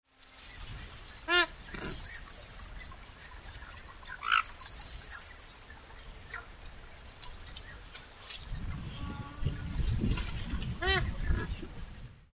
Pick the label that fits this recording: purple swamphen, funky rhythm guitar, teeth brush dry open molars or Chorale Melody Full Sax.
purple swamphen